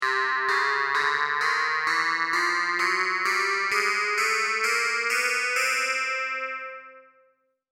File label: vocal